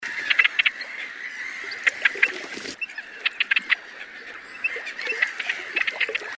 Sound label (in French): grèbe